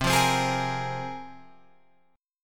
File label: C 7th Suspended 4th Sharp 5th, Guitar, Open F; C7sus4#5 strummed